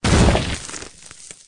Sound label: stones